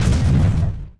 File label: SHORT EXPLOSION